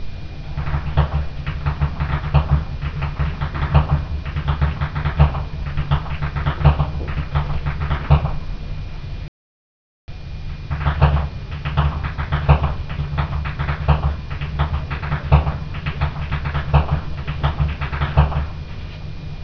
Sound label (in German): schnalzen, jugend; Steinbrunning